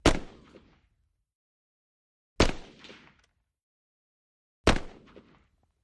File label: Russian SKS Single, sks 7x6